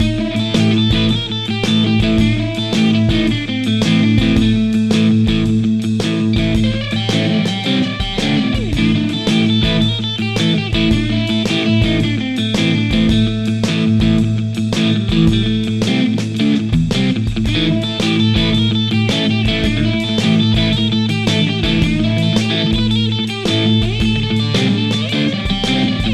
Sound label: Fender American Original 60 Jazzmaster Both Pickups